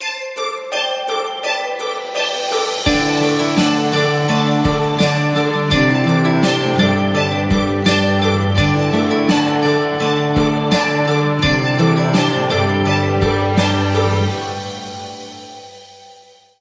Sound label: No Melody